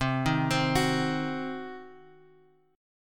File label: C Diminished, Guitar Open F, chord; arpeggiated; JGuitar